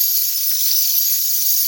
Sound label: move quick loop; LoL; teemo